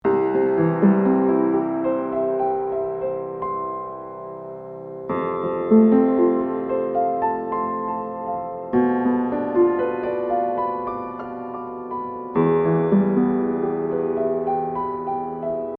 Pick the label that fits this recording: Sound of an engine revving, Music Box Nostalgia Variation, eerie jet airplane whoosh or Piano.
Piano